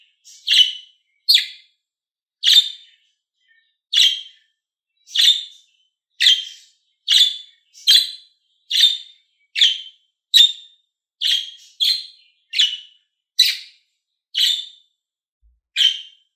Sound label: Budgie Sound